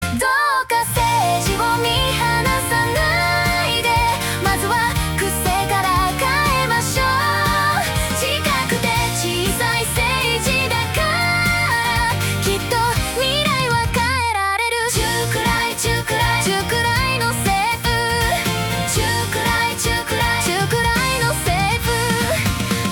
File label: opening chorus